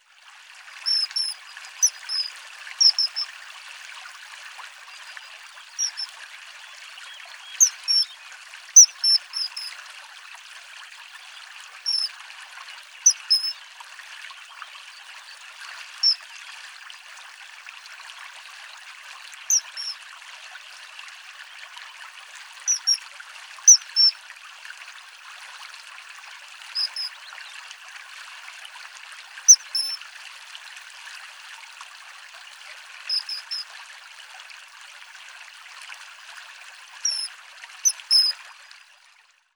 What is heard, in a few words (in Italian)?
martin pescatore